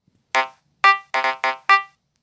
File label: Drum